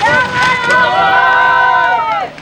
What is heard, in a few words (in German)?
abfahrt anfeuer; SkiAlpin2005